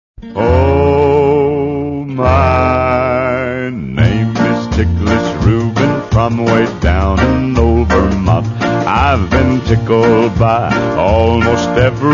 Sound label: laughing song